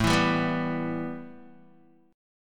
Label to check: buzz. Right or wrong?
Wrong